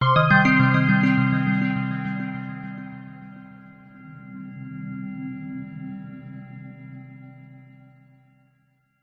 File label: multimedia game tone musical mystery complete end; zapsplat